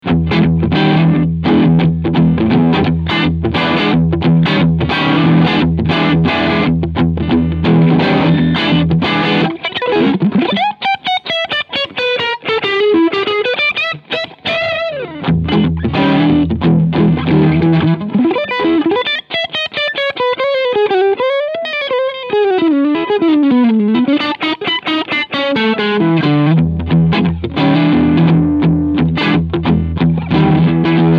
Jackson Audio Golden Boy Green Drive pur

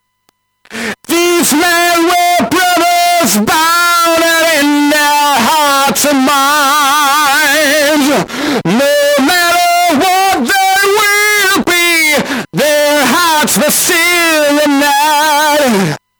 Distortion; Zoom V3

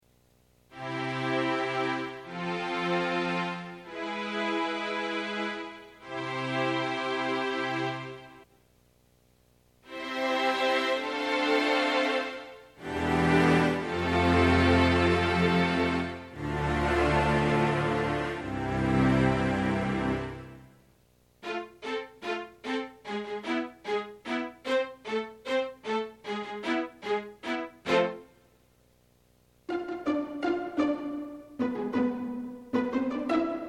PC3LE Strings

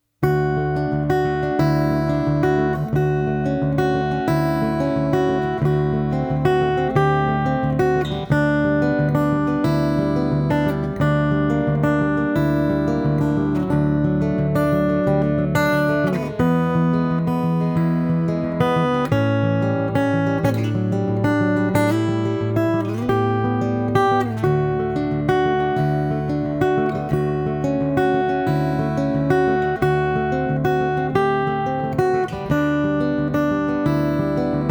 Fender Acoustasonic Jazzmaster

